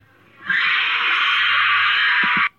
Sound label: scream; jumpscare